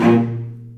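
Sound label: A#1, VCS arco, strings, R; East West; The Ultimate String Collection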